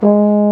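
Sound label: brass baritone G#2; Roland - LA Composer vol. 1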